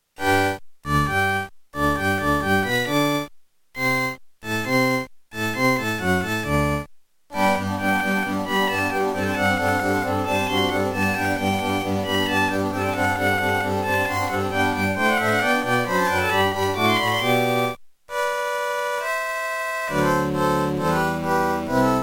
Eine Kleine Nachtmusik partial, Beepblaster 2.0 synth mode; classical; Mozart